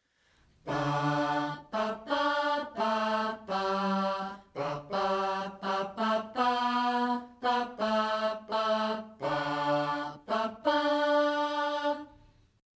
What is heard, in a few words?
Oh Happy Birthday